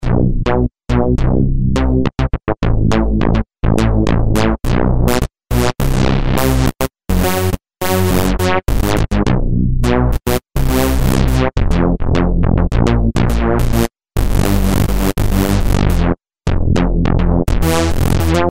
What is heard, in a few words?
Morph Bass; Combinator; Propellerhead Reason 3.0